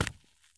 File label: soil sprint; Escape from Tarkov